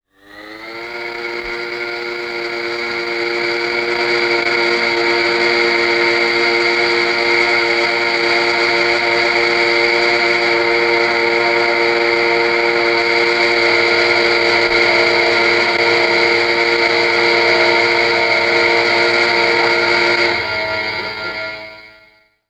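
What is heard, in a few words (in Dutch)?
elektrische centrale waarschuwingssein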